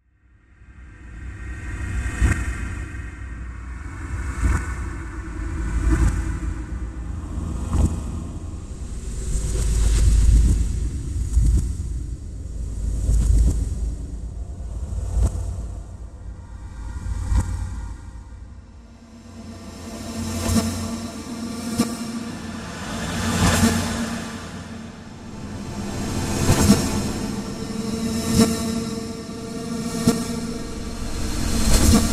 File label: Bed Ascend Cymbal Reverse Multiple Swells Reverb; Bed Sound Effects